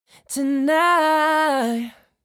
Ad-libs 100bpm A# Minor C# Major; Unison

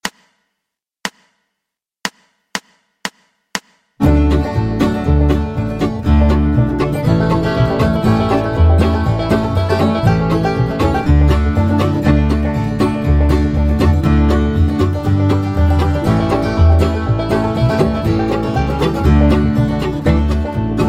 Chinese Breakdown (D)

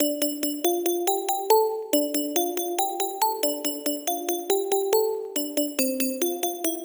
Pluck, Bell; Mello Collection; Highway To Bell

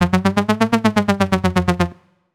Lead, Tech House

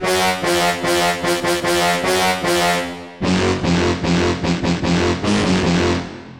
Lead; Trap Collection

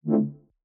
Chord Swell (Dm9); Peter Kuli

